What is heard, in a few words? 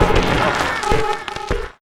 Paper Bumpers; Sonic Foundry (Sony Creative Software); Esoterik Beatz; Paper Fx